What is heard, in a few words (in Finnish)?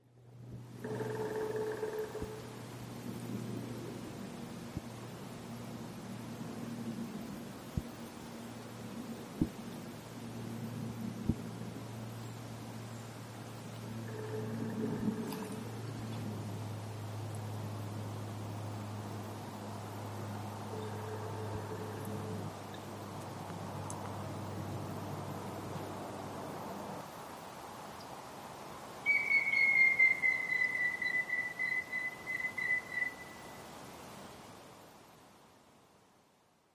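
harmaapäätikka rummutus; linnut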